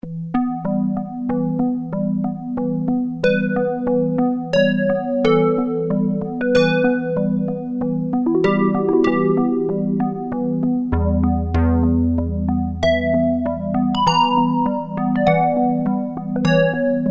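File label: Chorus Pluck, Roland Alpha Juno-2; Factory B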